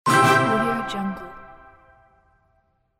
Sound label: reward